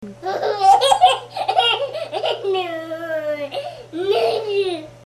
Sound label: laugh